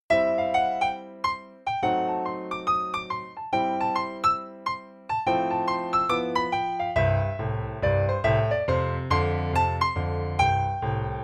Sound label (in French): boogie Piano seul; Jazz